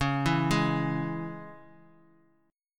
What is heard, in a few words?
C sharp Minor; C#m; arpeggiated; JGuitar